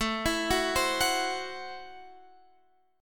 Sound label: A Minor 6th A Guitar Standard; Am6 arpeggiated; JGuitar